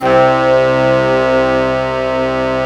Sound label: ARM BRASS C2, Synth; US ARMY